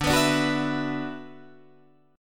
D 6th Add 9th D, Guitar, Open D

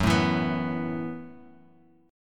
Gb Minor 11th Gb, Bass Standard, chord; strummed; JGuitar

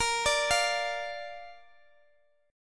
A sharp Major A sharp down Guitar DADGAD, chord

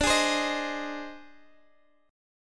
Ab Major, D, guitar chord; strummed; Ab/D; JGuitar

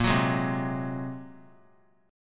A sharp 7th Suspended 2nd Sharp 5th; strummed; JGuitar

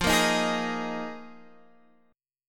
Bb 7th Suspended 4th F

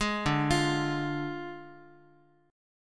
D Diminished D, Guitar; arpeggiated

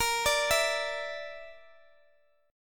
A sharp Major Flat 5th, Guitar, DADGAD

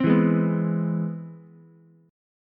C Augmented Major 7th C down Ukulele; strummed; JGuitar

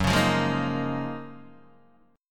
F sharp Major 13th Guitar DADGAD

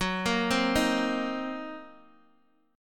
Gb 6th Flat 5th Gb down Guitar DADGAD

